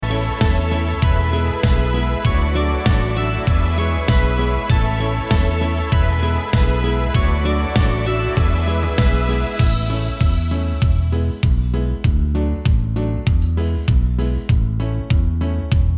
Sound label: cumbia